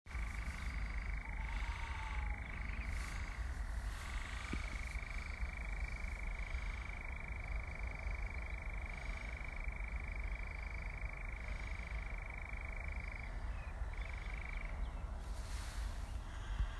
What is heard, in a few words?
New Forest National Park